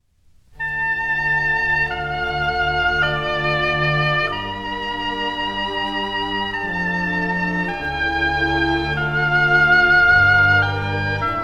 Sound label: concerto oboe; corelli; op5 n10